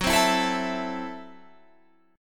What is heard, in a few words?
A Minor F sharp; Am/F# strummed